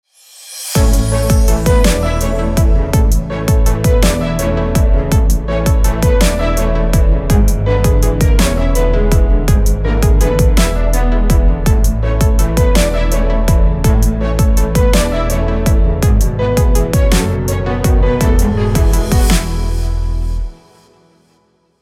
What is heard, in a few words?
Waltz With Tchaikovsky Ringtone